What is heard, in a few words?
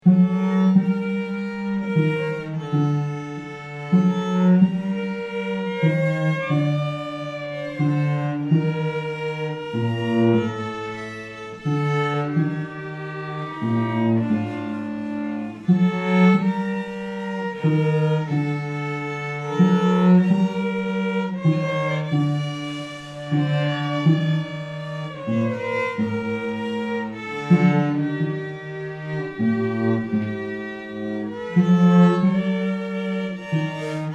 group improvisation